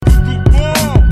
scratch

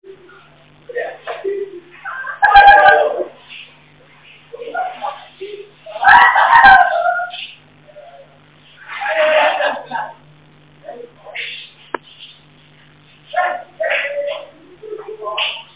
teen laugh

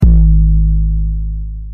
808 kick; im boomin

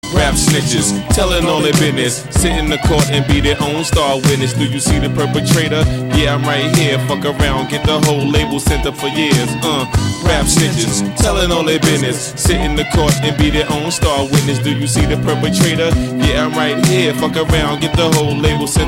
rap

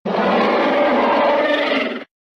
bowsers roar; mattmanmario saga dx

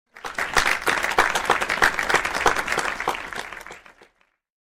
applause

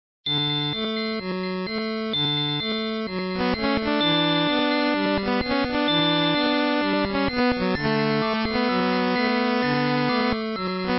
cello horn bell harp marimba triangle organ

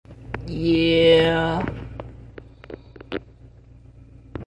yehhh

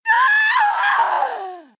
impaled scream; mk9; kitana

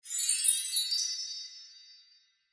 twinkle up short

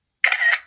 camera packing

